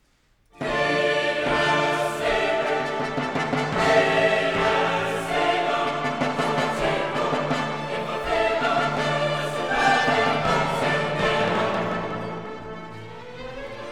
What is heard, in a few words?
Requiem In D Minor - Sequentia- Dies Irae; Mozart; K 626